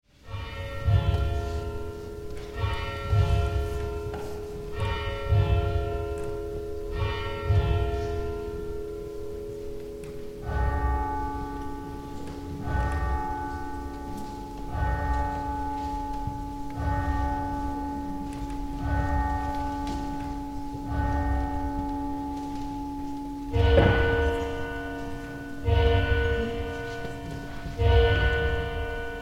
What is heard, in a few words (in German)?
6 Uhr Schlag und d-Valse